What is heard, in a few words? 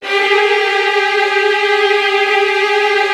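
Virtuoso Strings, violin trills, G2; ILIO; Kirk Hunter Virtuoso Strings VOL-1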